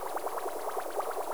Jet Pack

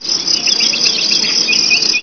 Bird

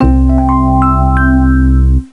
Present Win; Mystery Tales: Time Travel; NDS

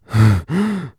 Fatigue Light

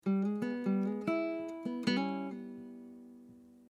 Family Ranch Stab

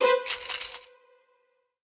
money down

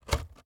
Bike Basket

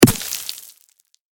Stone Impact

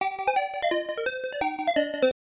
Lose; Dakkochan House; System 2; arcade

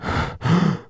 Fatigue Light

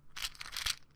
Pills

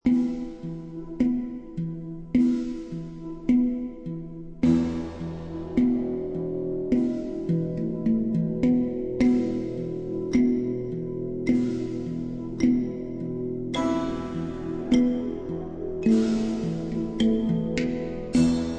Dungeon - Ancient Ruins; Darkend

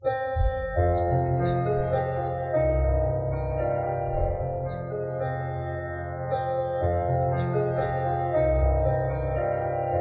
Piano Version; Age of Conan Hyborian Adventures; Hamlets of Aquilonia

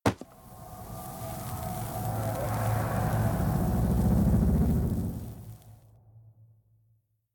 Ritual Book Burn; The Town

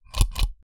Unplug

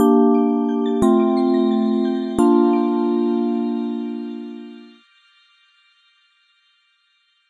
Retry Jingle

